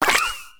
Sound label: Paint gun pickup; LittleBigPlanet 2; PS3